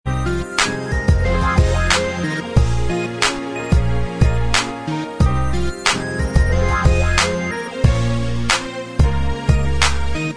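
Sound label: instrumental track, backing tracks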